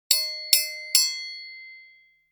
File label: Ding, phonetones, Nokia; Nokia E7